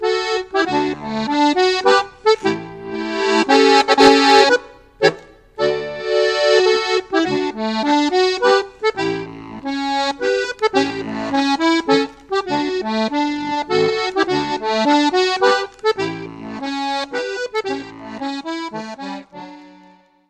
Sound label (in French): Landlerisch - Autriche